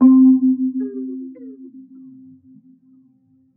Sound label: BELL (C), Pedal One Shots; Pyre Fusion; Cymatics; ANALOG EVOLUTION Beta